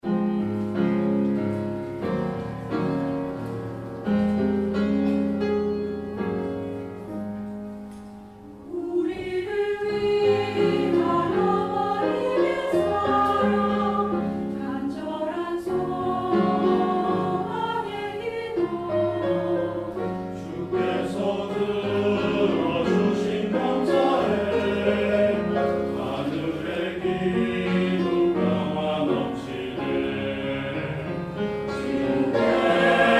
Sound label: Choir; EdenChoir